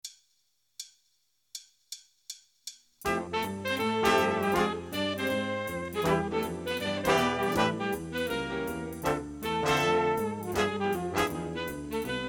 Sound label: band